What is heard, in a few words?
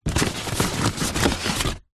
foley cardboard box open